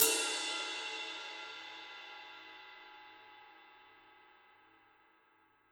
Ride Stick Mid Hard Dry; One Shots; Drums; Session

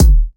Kick Impact

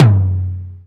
Tom DMX; One Shots; Drums

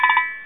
turn; Active Worlds

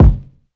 Kick; Ableton Live 12 Lite; Core Library; Drums

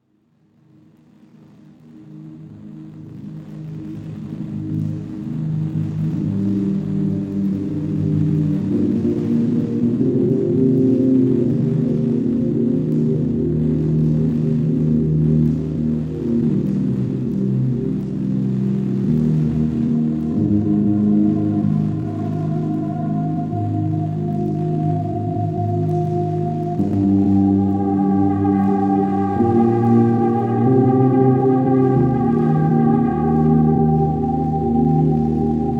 Some really obscure ambient tune

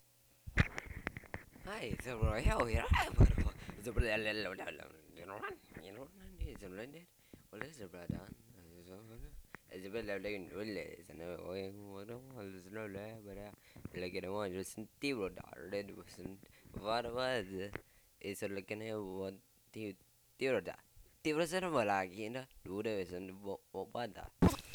crowd

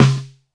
Snare Belly Ring, drums

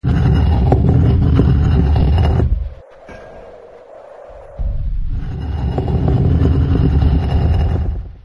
open close; Active Worlds

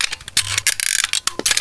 open; Quicken

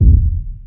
Kick; Ableton Live 12 Lite; Core Library; Drums

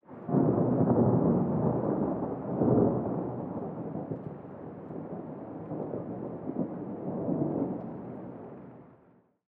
thunder; background

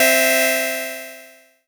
Lo Fi Voodoo C4, synths; Multisamples; Ableton Live 12 Lite; Core Library

